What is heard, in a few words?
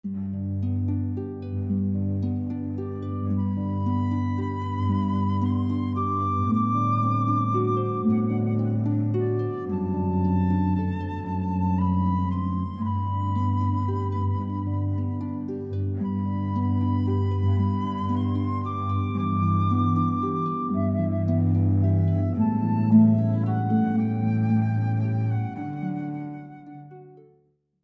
On Wings of Song, Mendelssohn, harp flute cello